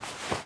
noise; Mafie 1

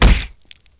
body; Mafie 1

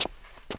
steps; Mafie 1 cz

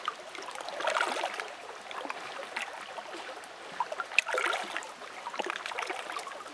pond; Mafie 1

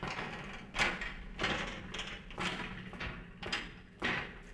people; Mafie 1

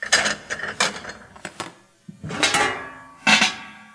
kitch; Mafie 1

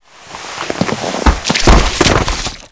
bodyfall; Mafie 1

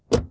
open; Mafie 1

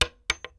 wood; Mafie 1

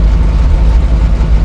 buick; Mafie 1

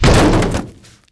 bodyfall; Mafie 1

